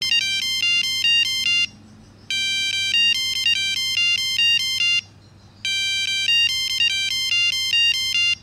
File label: iPhone Opening 8bit